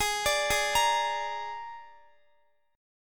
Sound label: G sharp Suspended 2nd Flat 5th, Guitar; arpeggiated